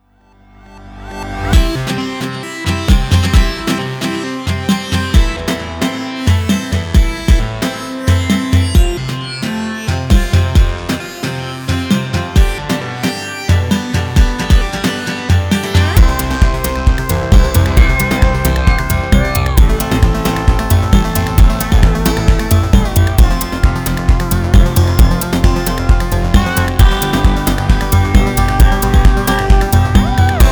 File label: electric ice cream